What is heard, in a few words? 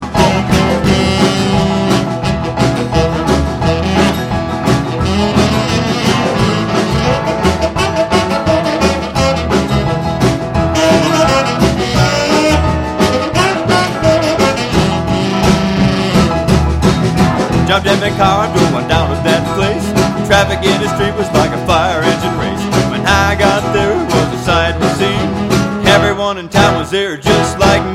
Screaming